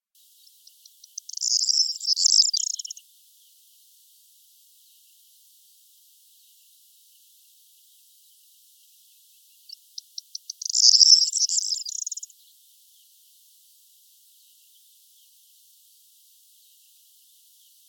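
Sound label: corn bunting voice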